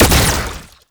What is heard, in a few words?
Proto Infested Brachiolyst Projectile Fire; Warframe; Abilities